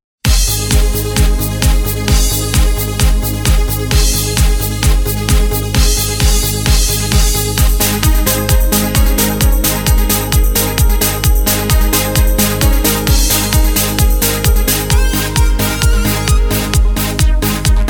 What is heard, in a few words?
Korg Pa3X